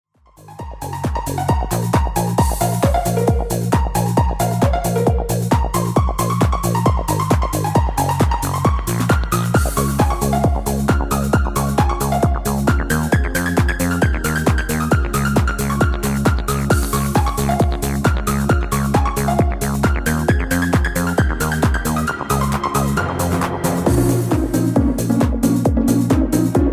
microwave radio

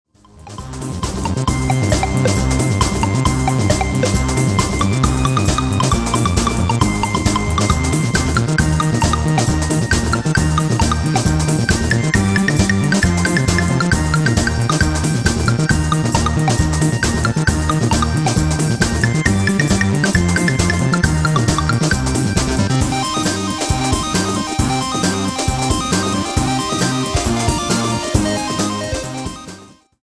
blip

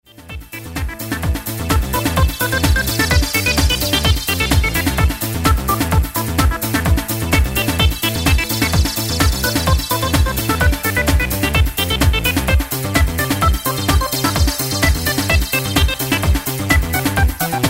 elevator, song

